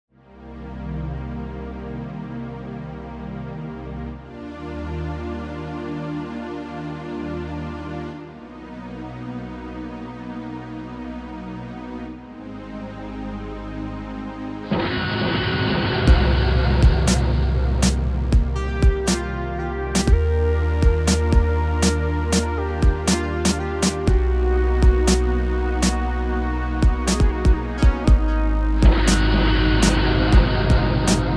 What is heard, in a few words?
Chip the Dinosaur vs The Analog Laser Synth; JigginJonT; Lunar Battle 2